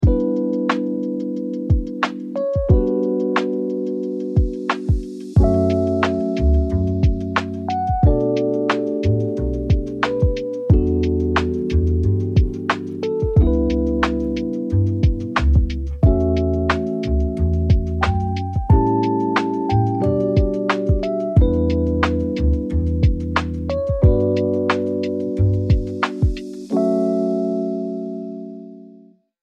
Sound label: smooth electric piano study